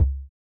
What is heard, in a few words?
kick; Mistergenerator